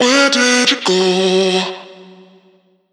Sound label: phrase F#m, Vocal; Slate Digital Omnivox Vocal Sample Pack